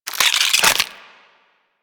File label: reload, pistol; Embolist; Warframe; Infested